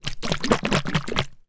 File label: household baby lotion shake in bottle